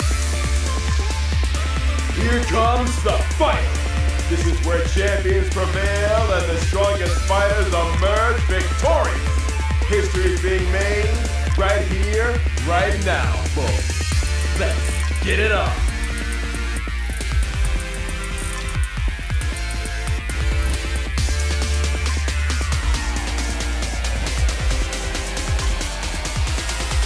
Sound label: Winning Theme; Capcom Fighting Collection 2; PS4, Switch, Windows, Xbox One; Type D